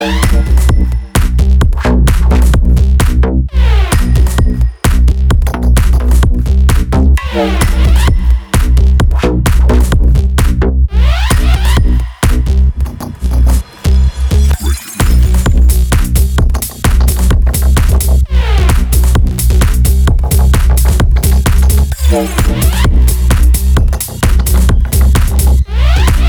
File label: Bass House